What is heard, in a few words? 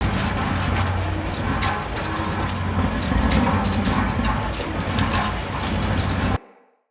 Pollution Tower Elevator Loop